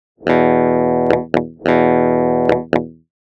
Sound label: SYNTHETIC Riff no distortion, electric guitar; electric guitar synthesis